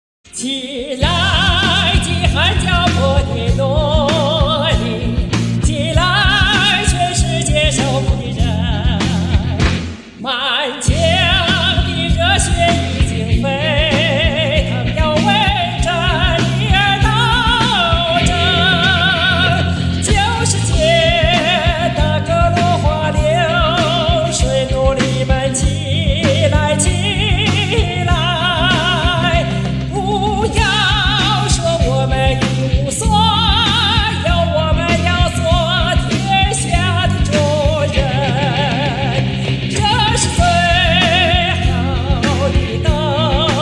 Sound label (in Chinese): 国际歌